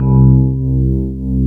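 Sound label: C4 electric piano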